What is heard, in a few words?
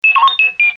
Robotic Beeps; R2D2